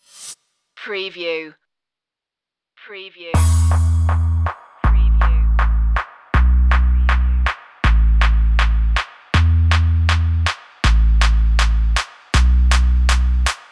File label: ride the waves bass and drums